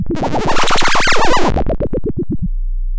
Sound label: pop